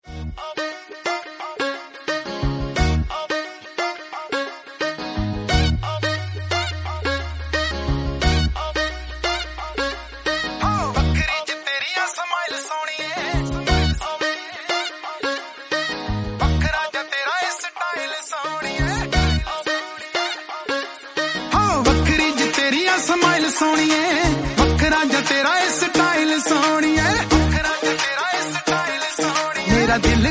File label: punjabi romantic